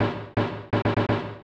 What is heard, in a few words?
Tile Match Rhythm; Shanghai 2 Dragon's Eye; snes